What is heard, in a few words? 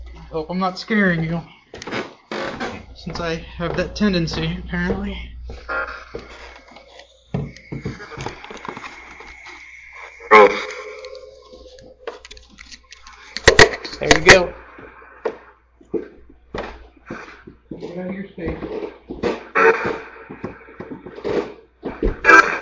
Living Room Portal